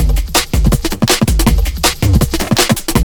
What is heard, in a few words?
JUNGL 160, JUNGLE LOOP